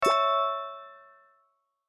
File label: Lifebuoy Awake; my cute commissar 2020 gamerip